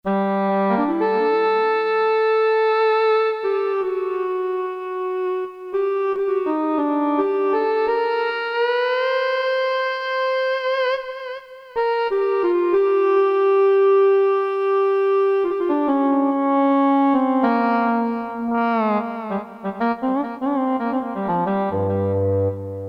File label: Echo Horn, Reeds, Korg DSS-1; Reeds 2